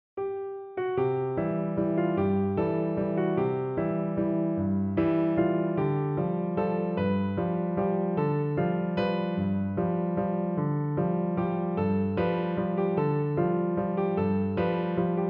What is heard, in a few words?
PNO